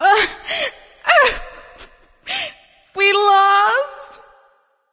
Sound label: boo hoo; daji